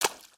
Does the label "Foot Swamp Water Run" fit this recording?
Yes